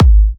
Kicks G#; markus hakala

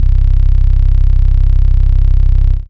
DM BAS, Dance Mega Synth; Best Service

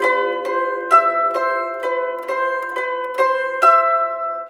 SITUR, SITUR PH; Spectrasonics; Heart of Asia

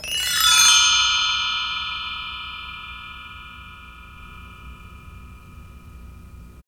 PRC BELL, Orchestral Perc; Roland LCDP03

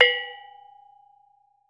PANARUS; E-MU Producer Series Vol. 7 – Old World Instruments

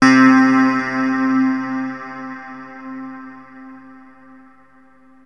Access Virus B; Synthworx

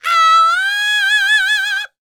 D BIT, Vocal R&B Dance

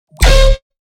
BASS ONE SHOT HONK

